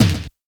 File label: best snare